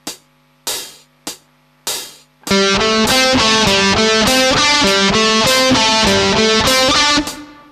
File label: accent